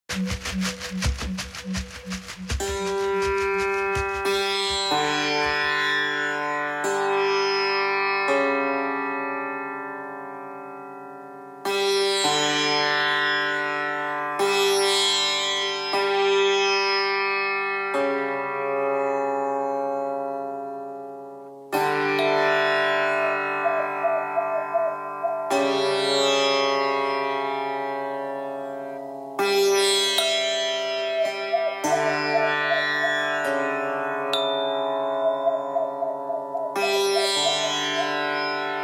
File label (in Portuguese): Instrumental